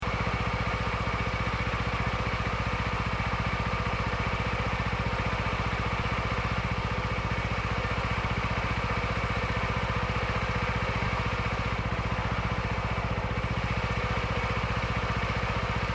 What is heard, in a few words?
Tractor Mechanical Planter Rice Field Idle Loop, Japanese rice planting tractor; Tractors; Vehicles